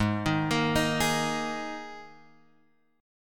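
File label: Ab Suspended 2nd chord, Guitar, Open F tuning; x,3,3,5,6,3; arpeggiated; JGuitar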